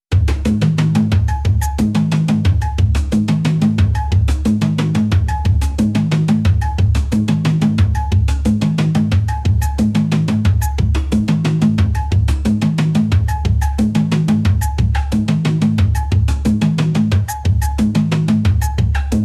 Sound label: tiki hawaii polynesian, tropical